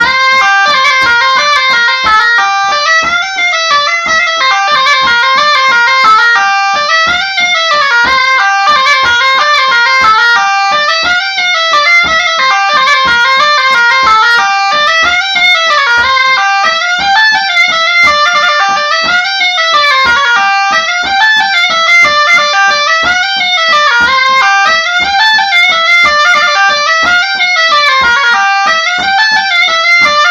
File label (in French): La Bourrée de Chantelave; Bourrees